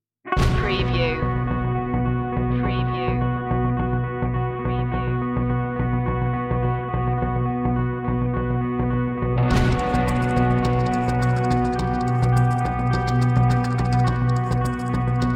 where spirits dwell no choirs

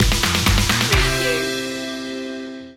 smile stinger

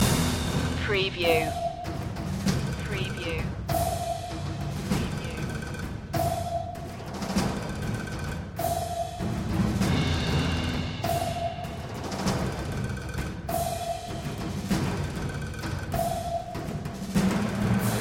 percussion only

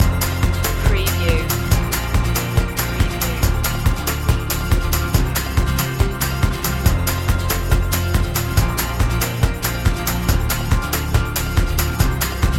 upbeat country fun loop

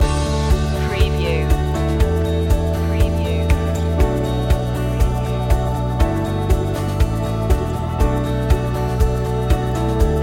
all good people loop

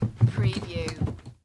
Dog Run Down Stairs

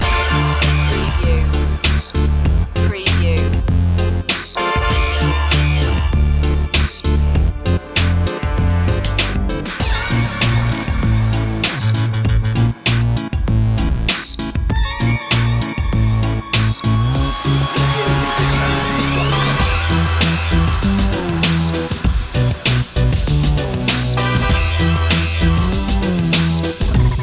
squeezer loop

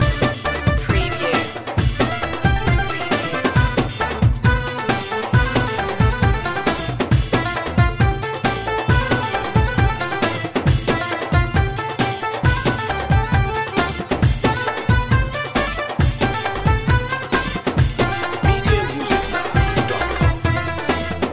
dance party oriental loop

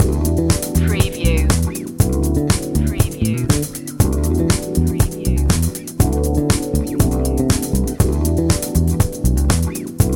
big city groove loop

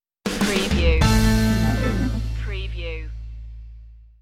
funk punch stinger

